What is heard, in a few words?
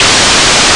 oqpsk